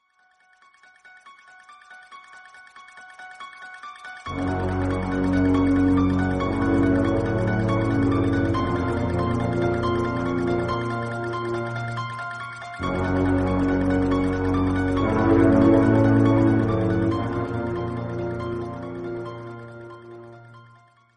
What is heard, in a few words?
ringtone; halloween theme